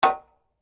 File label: SLEDGEHAMMER METAL IMPACT MEDIUM; Working with a sledgehammer; Hammers; Tools